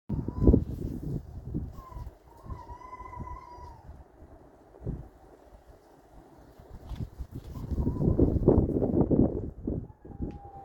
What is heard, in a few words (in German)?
Fuchs Paarung